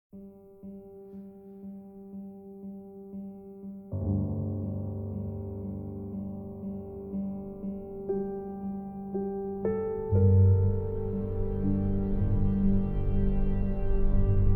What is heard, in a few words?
Larghetto - Piano, Celesta, Strin; Succession Season 2